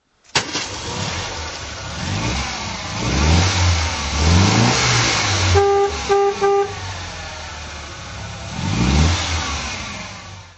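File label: mga 1500 throttle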